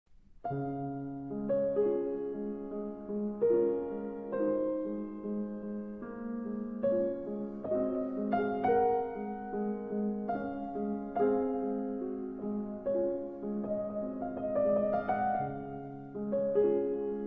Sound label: prelude in d flat major op 28 no 15 raindrop; מוזיקה קלאסית